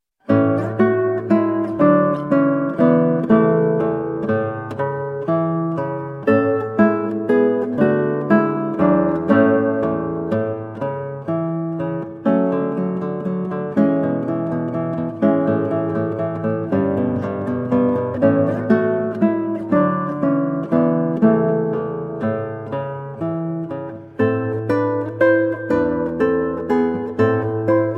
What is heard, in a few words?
Prelude in A Major; Preludes and Fugues